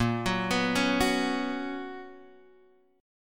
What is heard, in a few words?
B Major 7th Suspended 2nd, chord